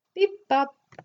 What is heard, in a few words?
beepbop